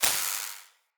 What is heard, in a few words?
Casting Device Projectile Destroy; Weapons; Player